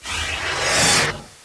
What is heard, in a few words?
frost reverse